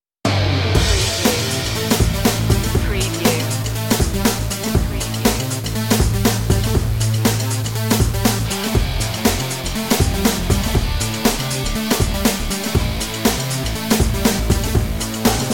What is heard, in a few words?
beat